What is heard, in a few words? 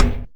impact Plate heavy